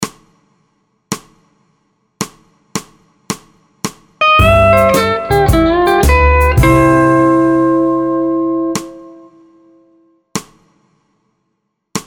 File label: Key C Vamp 4 4